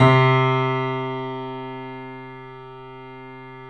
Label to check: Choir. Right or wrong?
Wrong